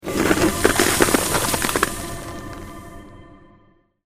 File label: magic spell freezing touch